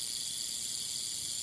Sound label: hissing, linksys wrt54g; linksys wrt54g hissing fix